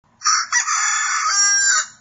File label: Rooster Notification